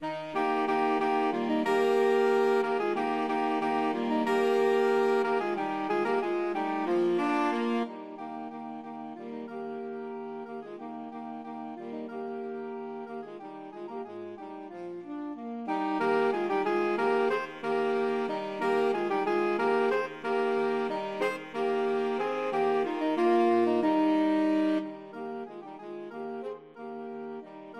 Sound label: vivaldi spring, saxophone quartet